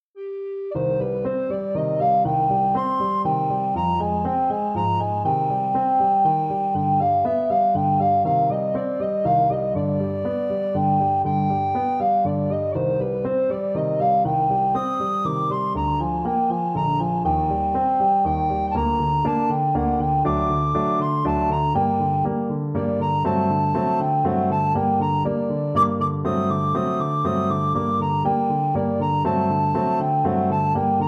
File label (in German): Klangbeispiel Altblockfloete; Nr. 3 Kraehmer Op 33 Band 2